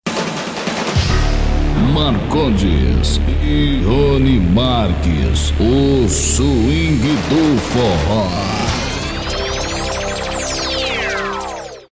abertura

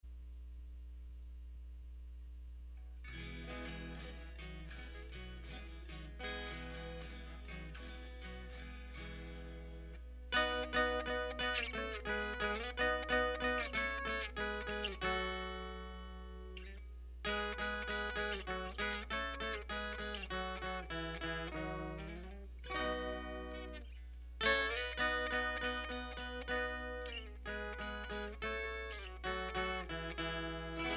viola instrumental

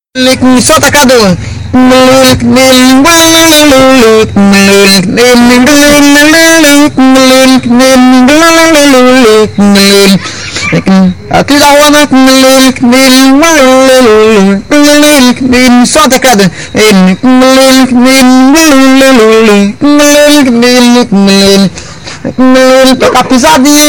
guitarra humana

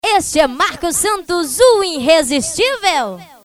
vinheta rodeio

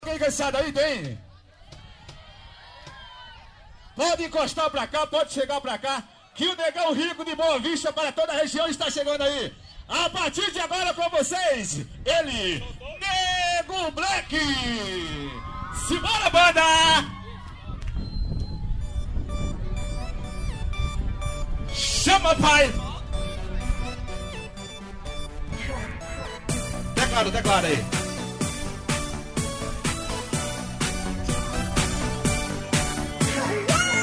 abertura ao vivo

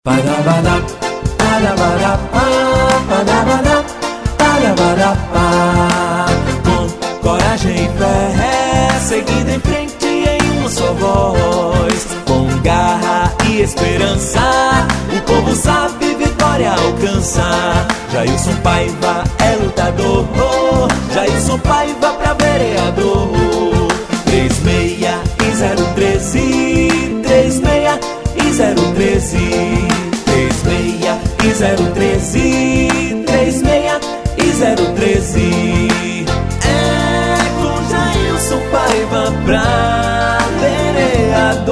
jingle

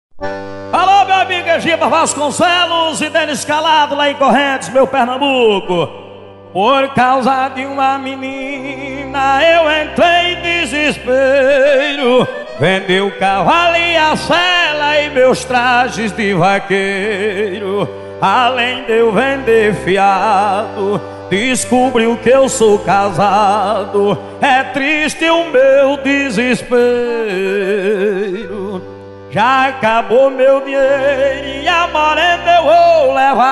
viola; explosão das vaquejadas

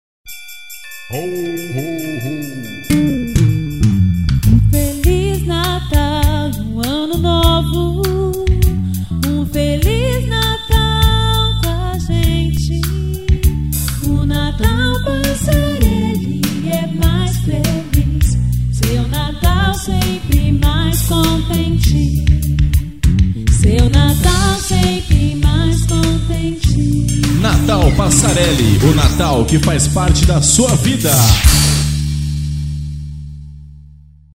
jingle natal

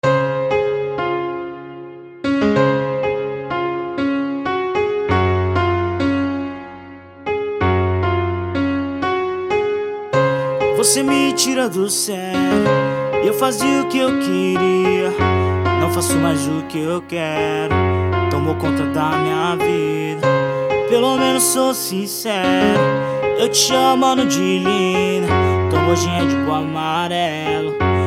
trap funk

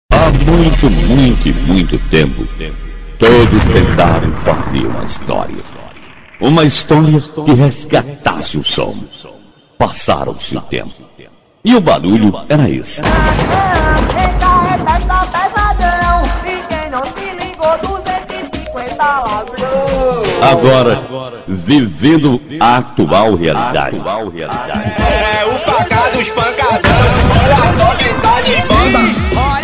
vinheta de entrada para show

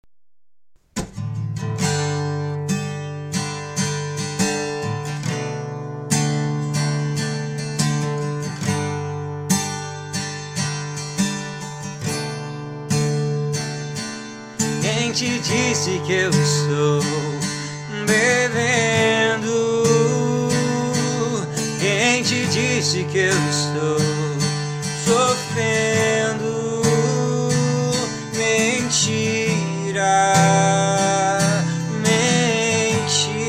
voz e violão

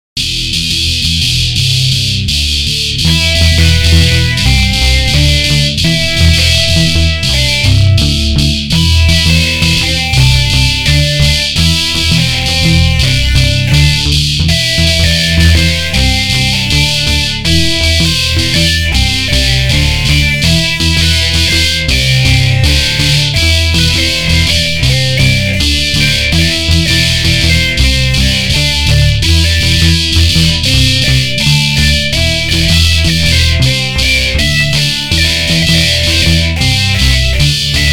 brincando com guitarra

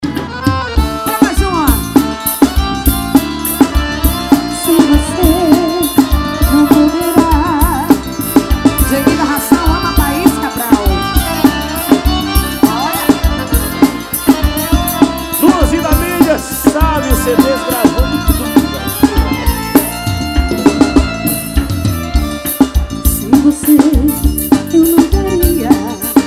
forro das antigas

